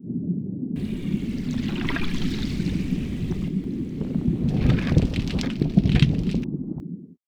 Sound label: Water Axe Floor; Yesterday Origins; Switch, PS4, PC, iOS, macOS